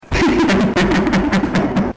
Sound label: laugh; cstrike